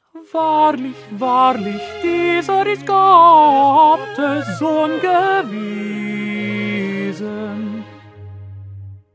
Tenor; Bach; St Matthew Passion

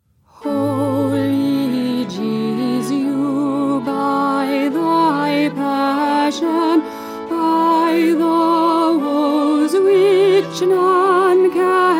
Alto; Stainer; Crucifixion

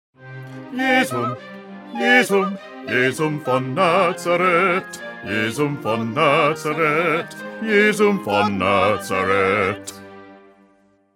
Bass; Bach; St John Passion

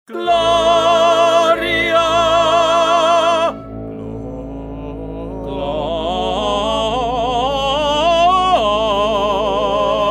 Tenor; Bach; Magnificat

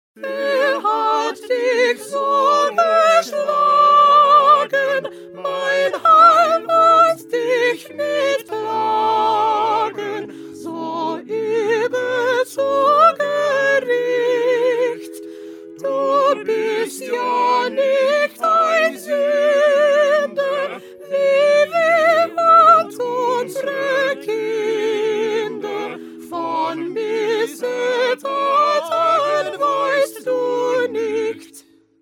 Soprano; Bach; St John Passion

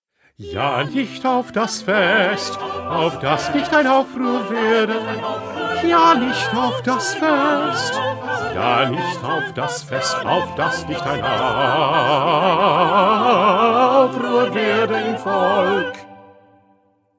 Bass; Bach; St Matthew Passion

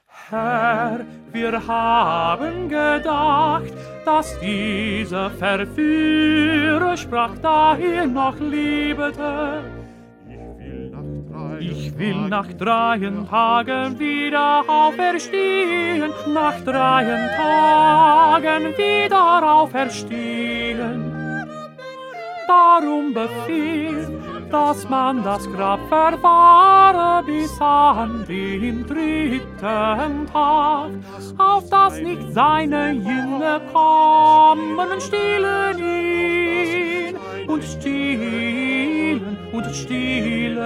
2nd Tenor

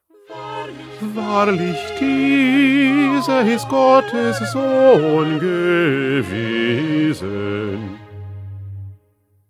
Bass; Bach; St Matthew Passion

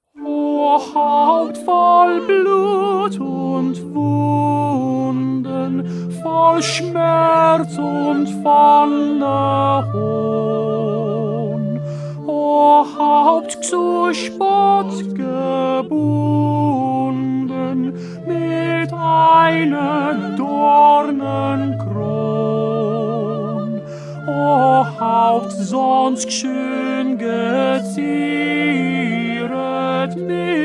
Tenor; Bach; St Matthew Passion

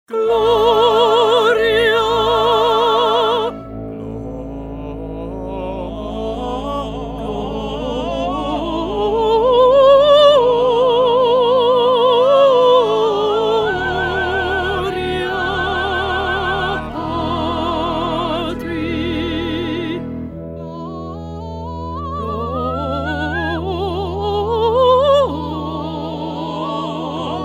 Alto; Bach; Magnificat

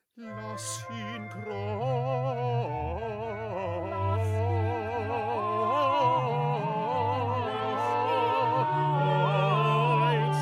Soprano

